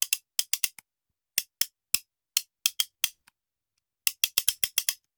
Hand Gun Foley; Sound Ideas; Guns

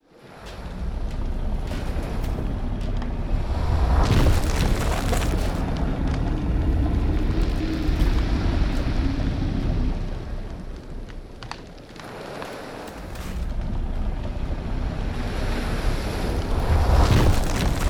Sea Main Deck

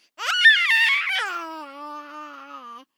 BABIES LAUGH Boy 12 months Screaming Amused; Baby Boom

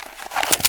draw winchester; firefight

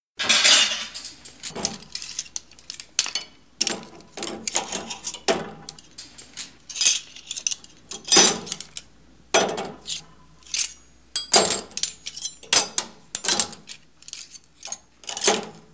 Flatware Movement; Home & Office; 1001 Sound Effects

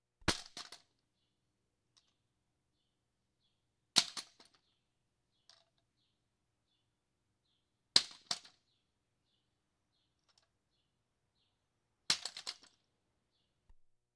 Police, Fire engines

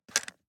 DICE on Plastic, Grab, Standard, 4 Four Dice; Samples; ArticulatedSounds; DICE Sfx Party (Extended)

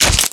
Melee Physical Medium; Kilta

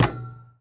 Street Ball Glass Back

